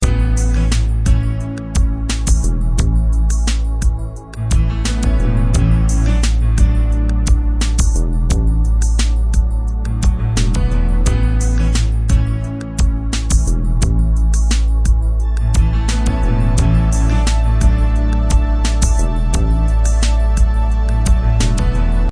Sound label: Grand Ballad - 8 bar loop; upbeat